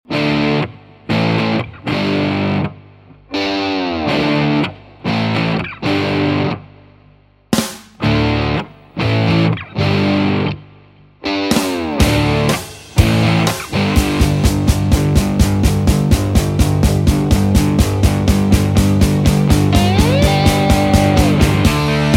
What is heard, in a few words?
No Backing Vocals